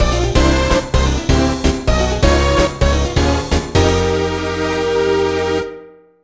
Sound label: Mini-Game Complete; Centre Court Tennis; N64